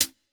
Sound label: Classic hiphop hihat